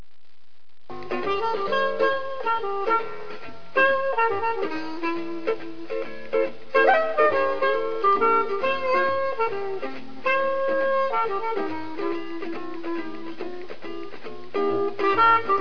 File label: dance